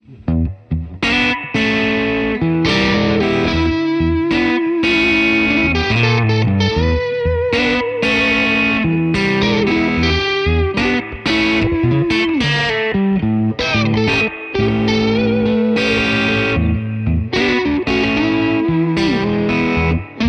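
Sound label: Stratocaster JCM800; mercuriall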